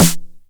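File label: 909 SD Tape, snare drum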